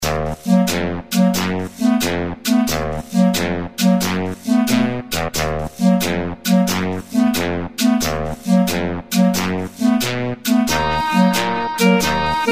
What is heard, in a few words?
Flat forest